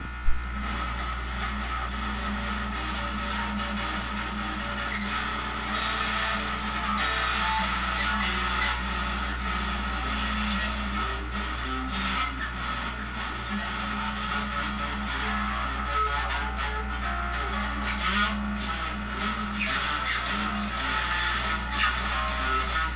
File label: adlib, guitar